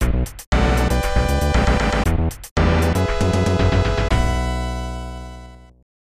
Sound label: Loser's Roar; Death Brade; SNES